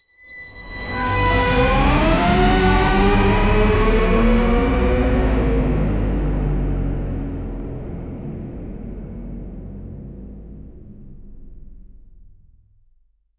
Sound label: a rising crescendo of; animation